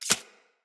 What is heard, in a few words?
Arachnoid Micro Step Snow; Enemies; Corpus